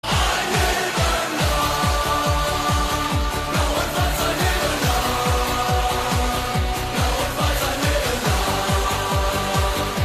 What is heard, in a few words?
goal celebration; schalke 04